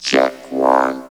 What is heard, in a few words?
VOCOD, Tekno Industrial; East West